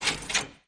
Folder Opened; Justin's New Sound Scheme; Happy Sound Scheme 1

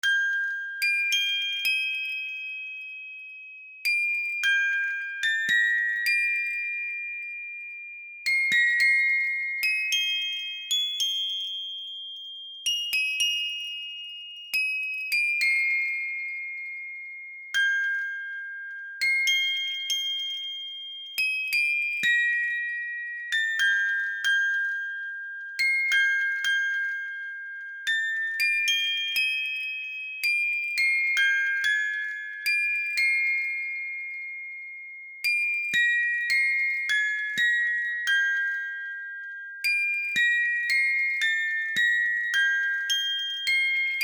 musicbox; Manamon Music; Manamon 2

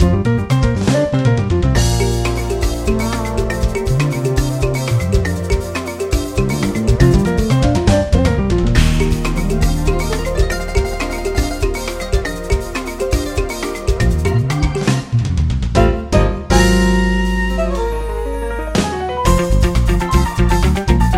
Flourish

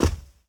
carpet land; Golden Crayon Sounds

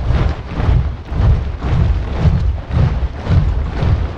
marching; Golden Crayon Sounds

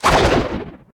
drop; Golden Crayon Sounds

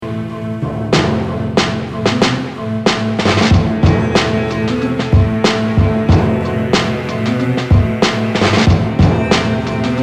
background; RS Games Sounds; Battleship

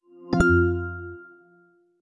iPhone 16e, iPhone Boot Chimes

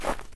snow step; Golden Crayon Sounds

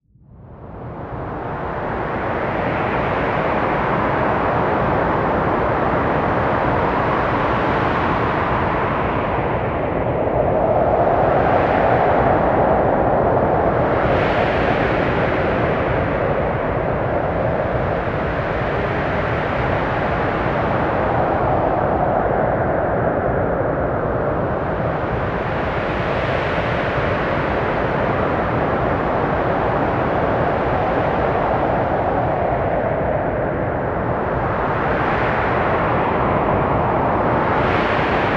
Strong Wind; Timecrest Music

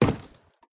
wood step; Golden Crayon Sounds

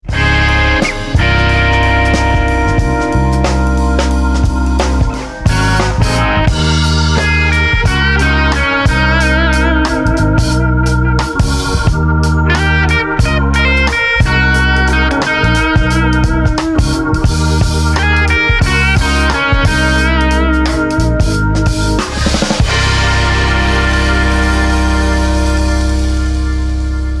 main music; RS Games Sounds; Farkle

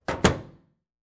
door close; Golden Crayon Sounds

